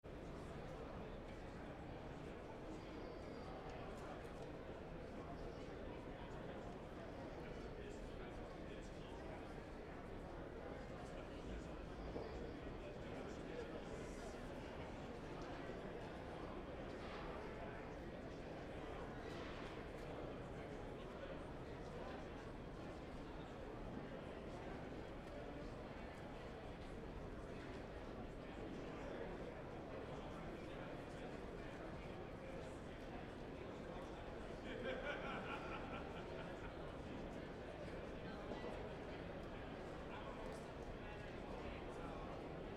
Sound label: Convention Hall Ambience Noise